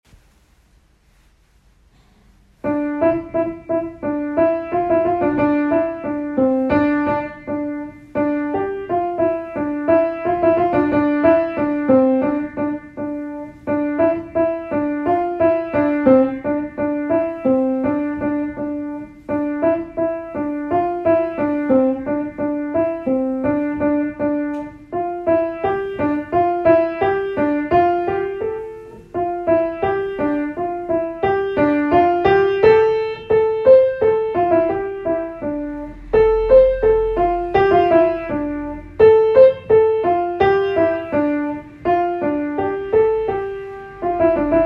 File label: piano